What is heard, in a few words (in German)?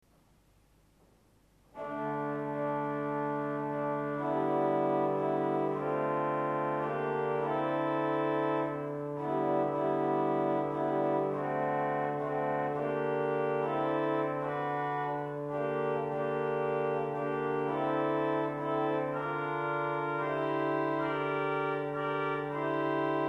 Gambe 8, Orgelstimmen